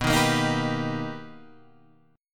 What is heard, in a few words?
B Augmented Major 7th B Guitar Open G, chord